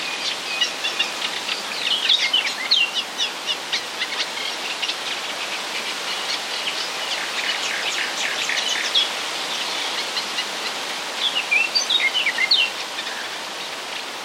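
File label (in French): rousserolle effarvatte fauvette tete noire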